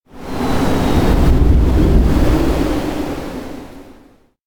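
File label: electronic big air vortex; anemoi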